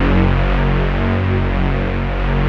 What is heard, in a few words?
PAD, Vintage Voltage